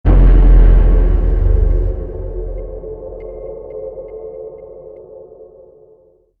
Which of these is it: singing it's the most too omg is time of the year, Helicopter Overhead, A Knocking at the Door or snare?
A Knocking at the Door